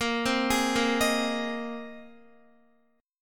Bb 7th Suspended 2nd Suspended 4th Bb, Guitar 4E 4A 5D 5G 5C 6F; Bb7sus2sus4 arpeggiated; x,13,10,13,10,10